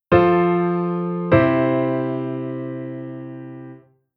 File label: Chord Function S1